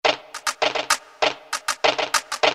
GUIRO, Roland SN-U110-02 Latin And F.X. Percussions